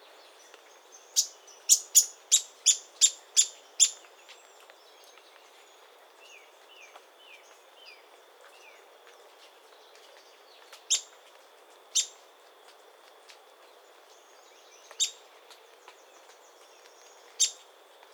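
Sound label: Montane foliage gleaner, bird; برگچین کوهی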